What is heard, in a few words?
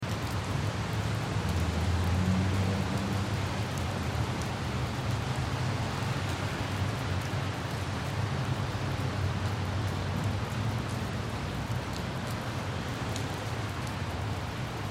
City Rain Light Drizzle Traffic; City Rain Light Drizzle Traffic Loop; Ambience; Cities; Blastwave